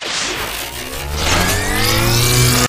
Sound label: spectre overload close; Titanfall 2